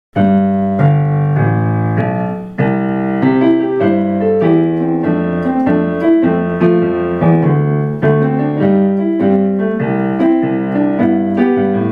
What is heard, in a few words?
Swinging Hangul acc; NHKテレビハングル講座2005－2006年挿入歌; 伴奏だけの演奏